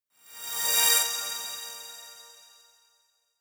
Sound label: sweep